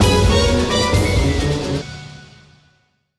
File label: sting battle defeat